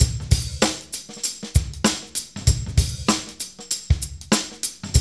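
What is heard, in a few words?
96 bpm, Funky Drums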